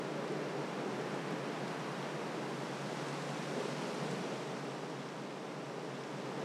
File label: tree sway wind; ambient